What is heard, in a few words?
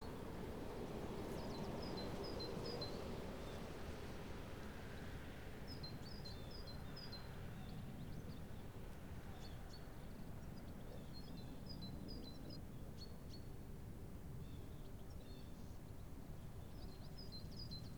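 Motorbike ambience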